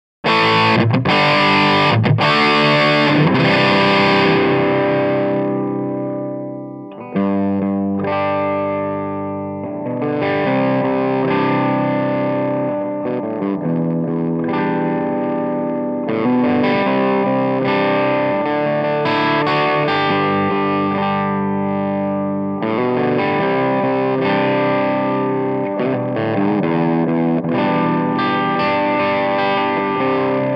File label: 6watt el84